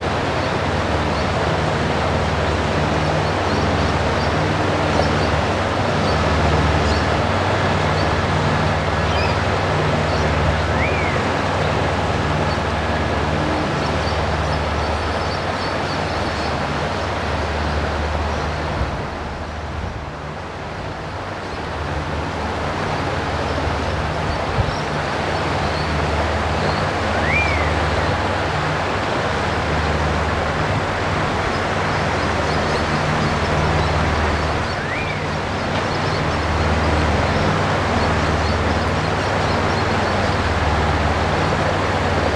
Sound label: top of creek, Lake; Coburg Lake; Coburg Lake 2026